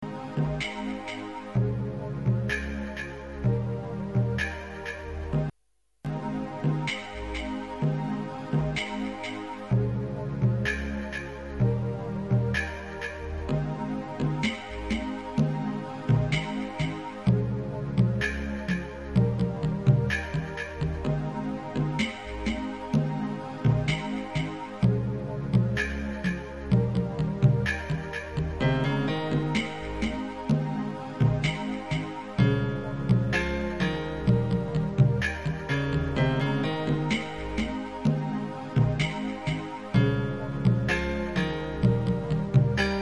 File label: synth